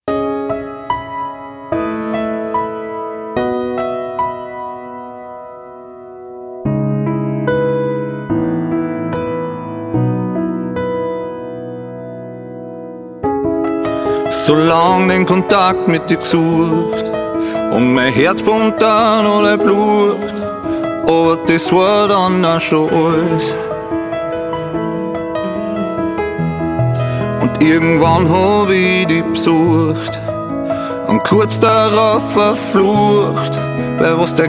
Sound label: American Dream Acoustic